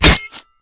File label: Spear Shell, Damage